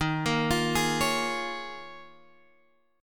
Eb 9th Suspended 4th, Guitar Open G

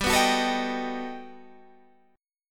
G Augmented Major 9th, Guitar, Standard; G+M9 strummed; JGuitar

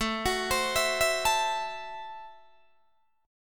A Minor 6th A Guitar DADGAD

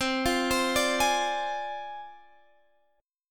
D Minor 7th Flat 5th C, guitar chord

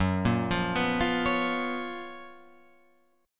Gb Major 11th down Guitar Open F; GbM11 arpeggiated; JGuitar

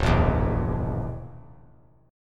C sharp Minor 6th Add 9th C sharp; C#m6add9; strummed; JGuitar

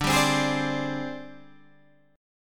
G sharp Major 7th Flat 5th

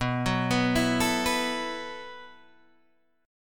B 7th Suspended 4th B down Guitar Open D

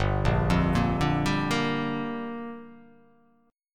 G Minor 7th

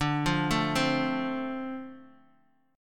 D-Minor 7th D Guitar DADGAD, chord

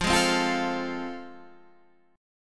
A Minor Major 7th Sharp 5th, F

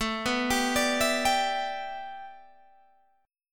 A 7th Suspended 2nd Suspended 4th Guitar Open G

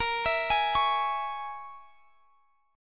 Bb Minor 7th Flat 5th, Guitar, Standard; x,x,20,21,21,21; arpeggiated; chord shape

